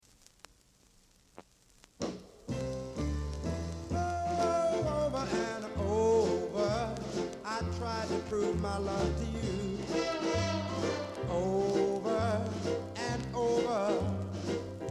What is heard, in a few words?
blues